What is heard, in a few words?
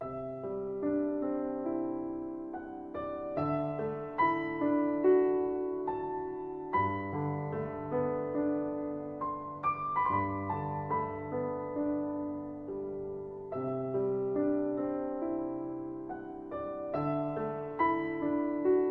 Piano